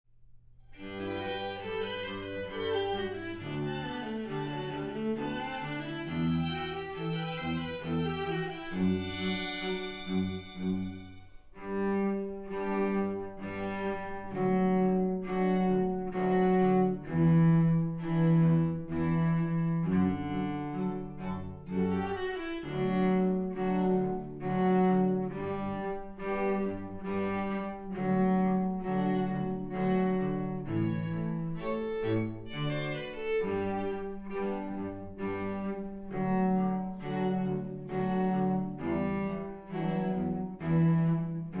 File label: with cello